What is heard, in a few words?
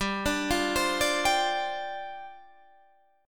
C Suspended 2nd Suspended 4th, G